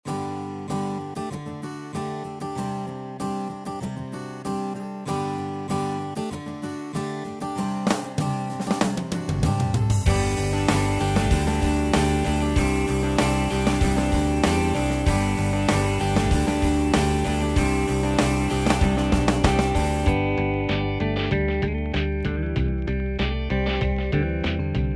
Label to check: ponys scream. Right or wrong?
Wrong